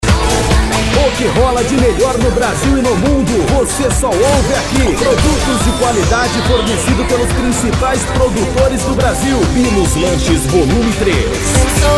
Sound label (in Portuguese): electro funk e electro dance remix